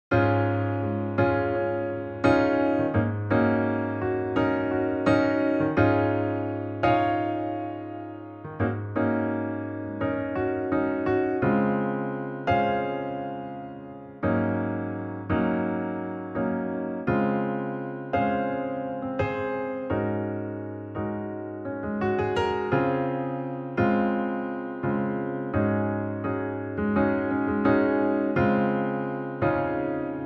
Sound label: Db piano